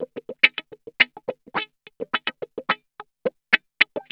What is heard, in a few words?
SCRAPE, Funk Guitar; Zero G - Funk Guitar